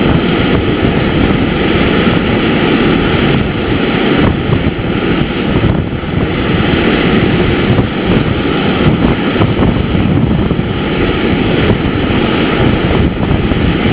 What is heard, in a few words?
fumarole